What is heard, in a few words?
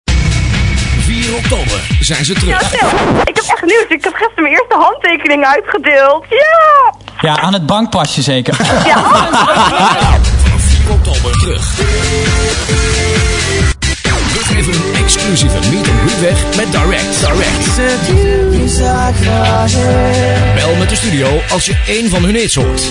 jingle; Favoriet FM; schools out; gijs zegers